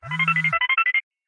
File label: Cellphone Ring